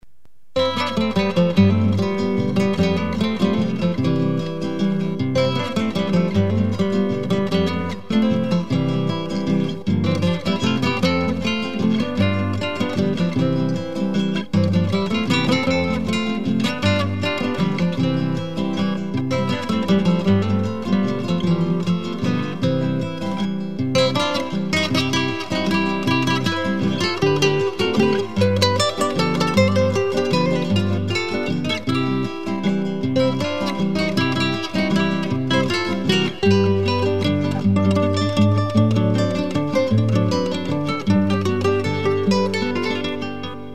gitaar wals

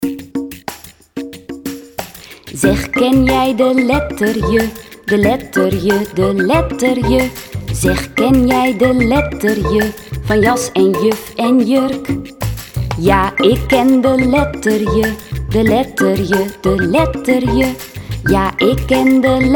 Zeg ken jij de letter j lied; taalsprong; ik jij wij

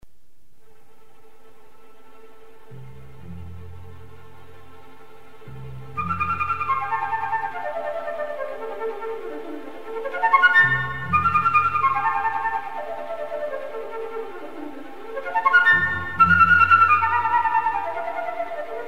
fluit allegro

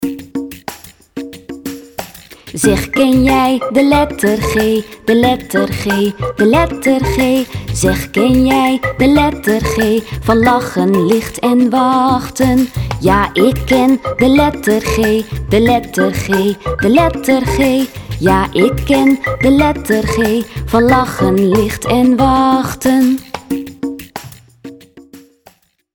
Zeg ken jij de letter ch lied; taalsprong; ik jij wij

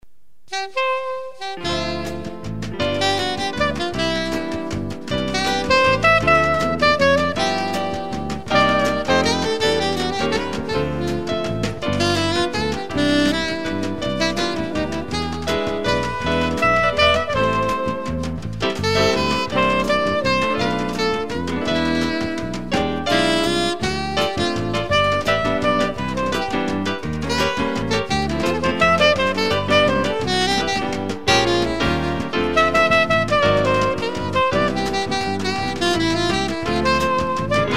saxofoon wals

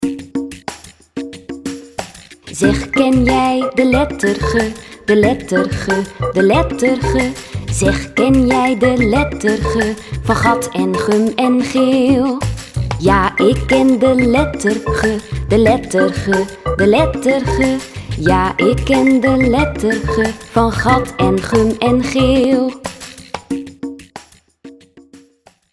Zeg ken jij de letter g lied; taalsprong; ik jij wij

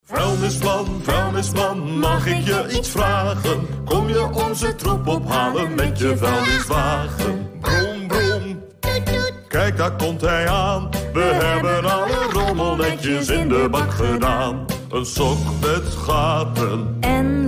lied; vuilnisman; taalsprong; afval in de klas

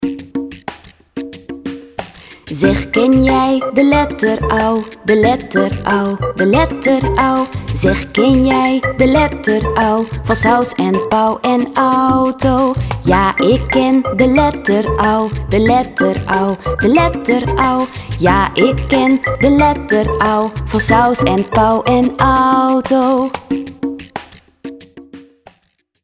Zeg ken jij de letter au lied; taalsprong; ik jij wij

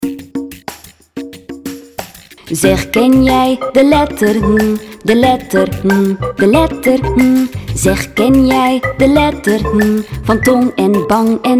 Zeg ken jij de letter ng lied; taalsprong; ik jij wij

